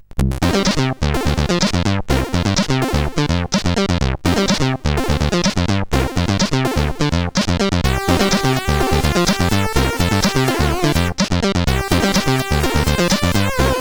Slappy Funk, CSG8580R5

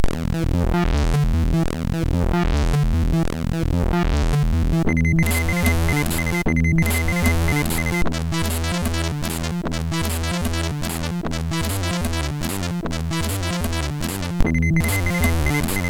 Techno Tune, MOS6581R4

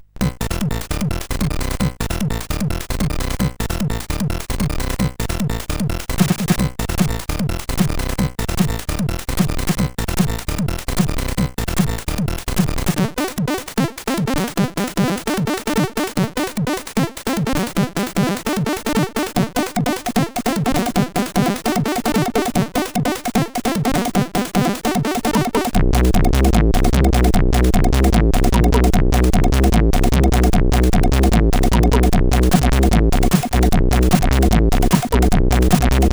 House, CSG8580R5

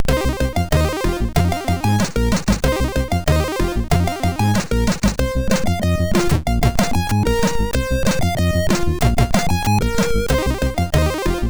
Tree Tune, MOS6581R4

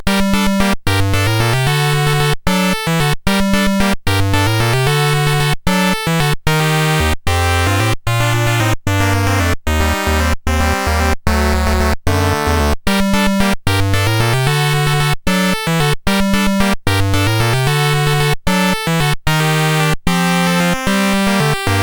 sid CSG8580R5; Nine Princes in Amber

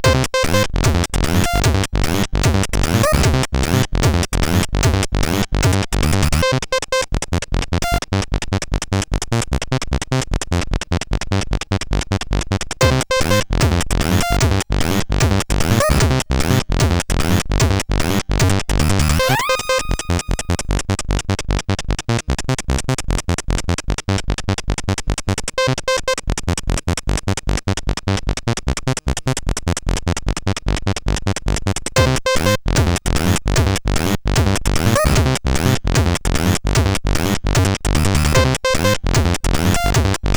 Hey Little SID, sid CSG8580R5

